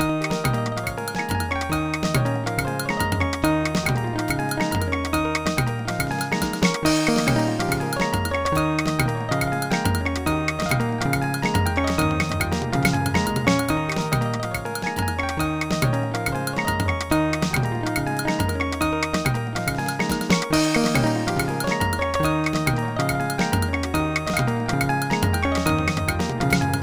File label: solo; Dr. T's MIDI Recording Studio